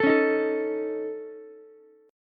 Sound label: A Major Flat 5th A Ukulele, chord; AMb5; strummed; JGuitar